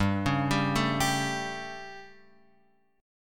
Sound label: G Suspended 2nd Flat 5th G Guitar Open F; Gsus2b5 arpeggiated; JGuitar